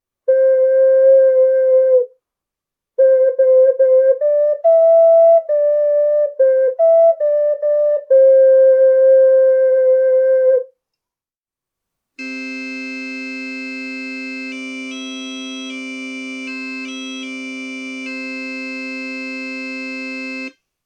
fingering

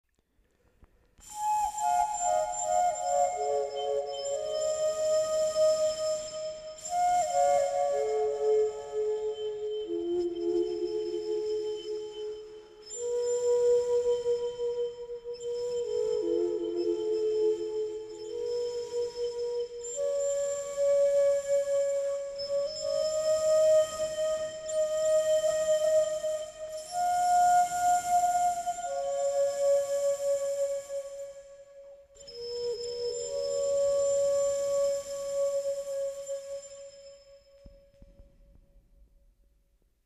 xun

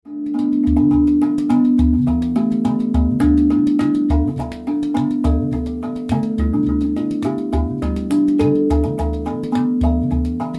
udu; hapi udu

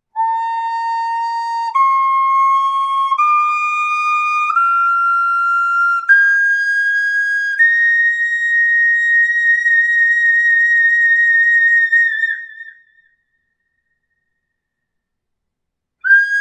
eagle

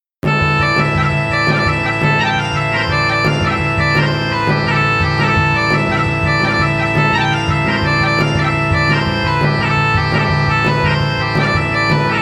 bagpipes

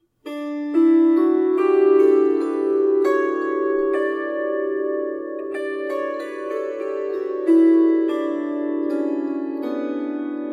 kantele

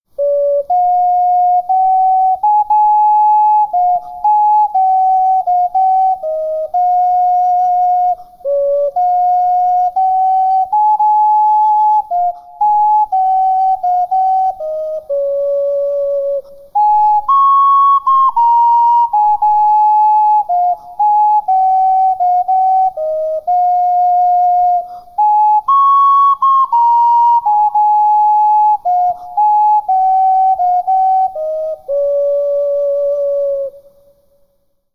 chacarina

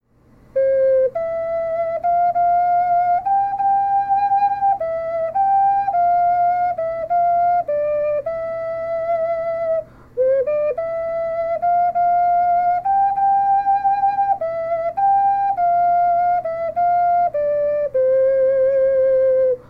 org home

